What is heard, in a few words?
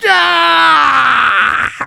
Civilian pain critical death